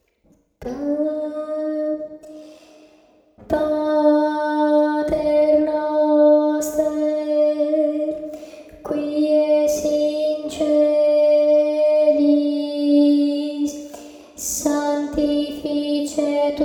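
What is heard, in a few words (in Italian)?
contralti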